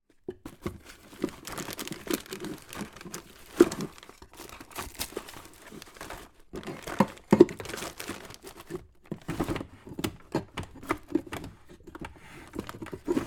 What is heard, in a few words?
household kitchen draw rummage through